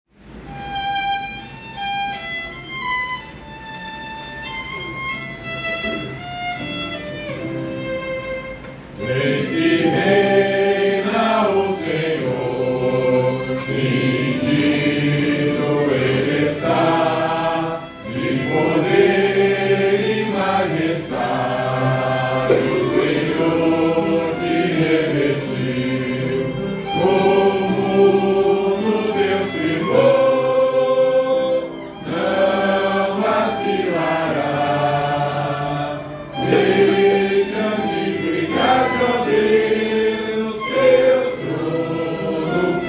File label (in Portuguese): salmo cantado